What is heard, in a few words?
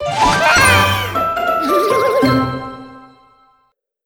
Crunchy Finish